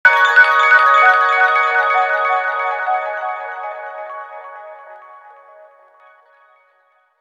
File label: Bio Vibe, ringtones, Motorola Backflip